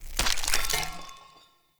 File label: Foley Get Up Back; Enemy; Characters; Corrupted Sproutfolk